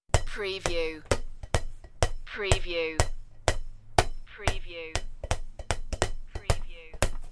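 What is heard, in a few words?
Bouncing Ball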